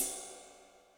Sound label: Ride, Yamaha DTXpress Cymbal